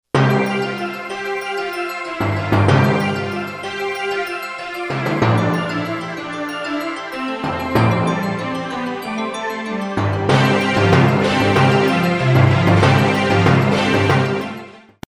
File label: musicbed sample, industrial